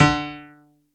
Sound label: PNO D2